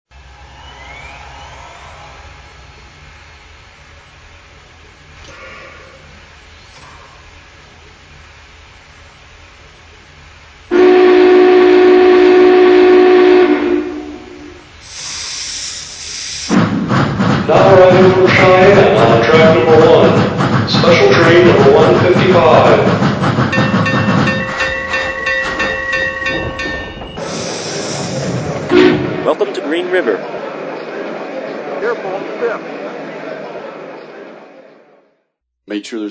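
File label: Big Boy 4014, locomotive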